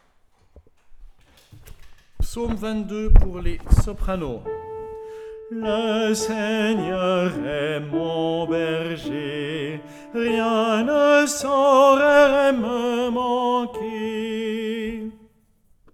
Chants Psaume 22 S

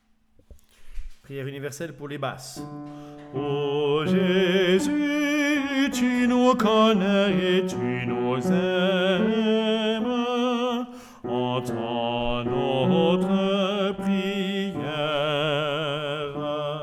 Chants Priere universelle B

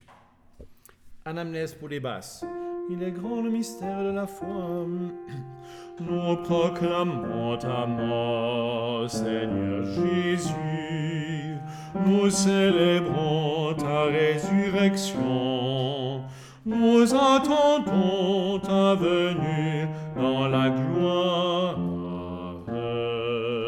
Chants Anamnese

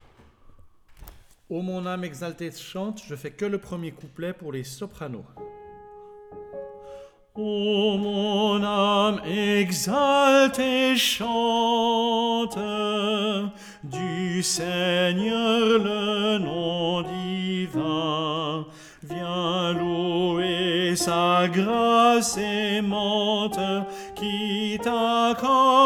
Chants O mon ame exalte et chante S

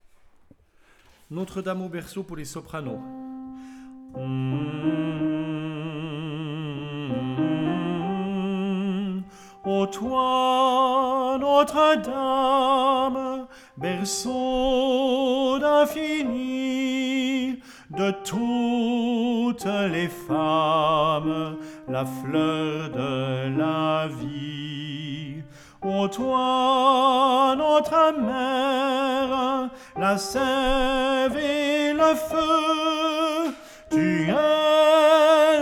Chants Notre Dame au berceau S

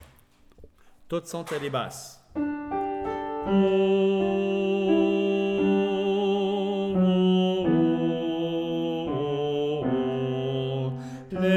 Chants To Tsante B

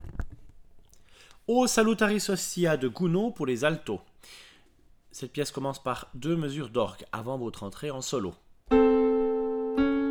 Chants O Salutaris Hostia A

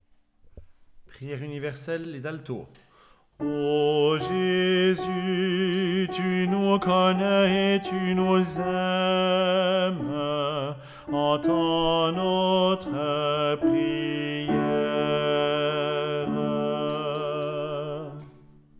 Chants Priere universelle

